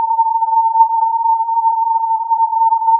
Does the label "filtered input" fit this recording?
Yes